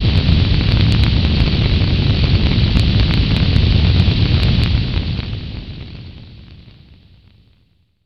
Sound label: burn; colobot